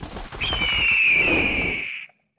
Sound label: hawk reveal; weapons; dota; beastmaster